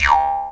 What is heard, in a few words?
D JAW, Primitive Instruments